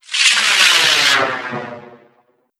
Rocket; Hi Tech

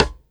Rim Rim Click

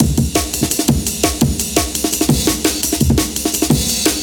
jungle, pitchglitch loop

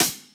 Hi Hat Open

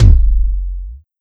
Kick House

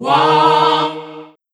Vocal Choir Wahhh

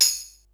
tamborine

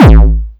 Kick Dist E Kick 2; Erratic

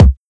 Kick Dubstep

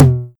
Tom Mid TR909

